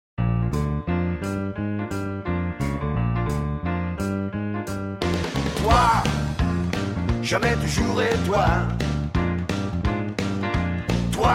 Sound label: rock n roll french